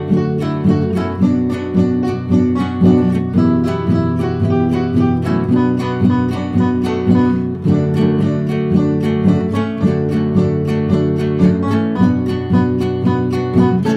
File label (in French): extrait instrumental